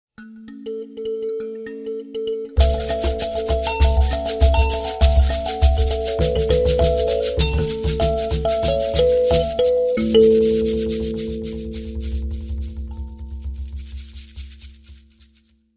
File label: Thumb Piano Rhythm